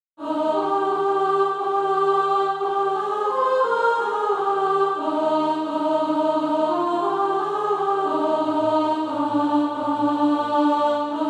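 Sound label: alto, Practise Music; SATB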